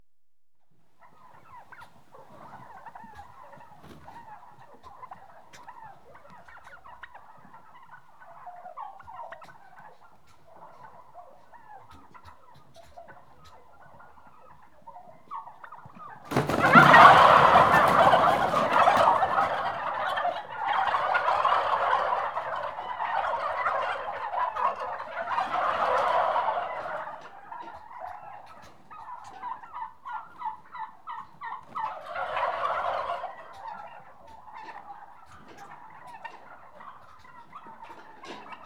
Six Month Old Turkeys; Livestock; BBC Sound Effects Library

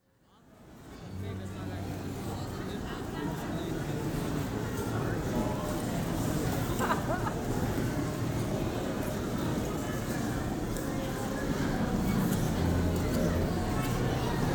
Theatre Foyer, Changchun; China; BBC Sound Effects Library

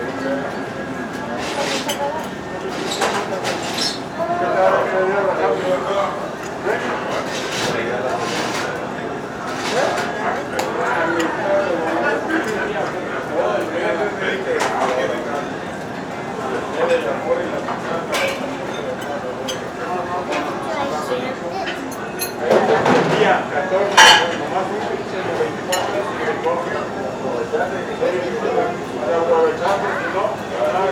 Ambience & Backgrounds New Mexican Diner; Bars & Restaurants; SFXSource

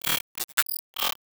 Glitch Error Malfunction; INACCURACY (GLITCHES); MatiasMacSD

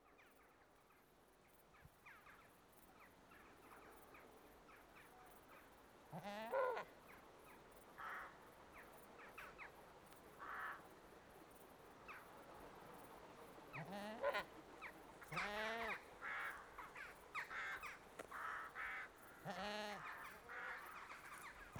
One British Saanen Goat Bleating; Livestock; BBC Sound Effects Library

